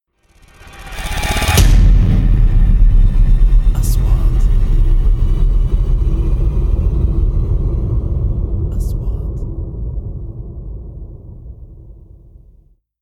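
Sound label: 3D SFX Trailer; مؤثرات تشويقية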